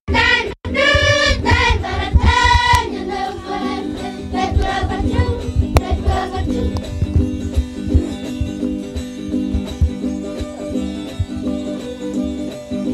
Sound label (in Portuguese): guaranis aldeia silveiras